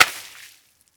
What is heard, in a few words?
SPLAT, CARTOON; 1000fx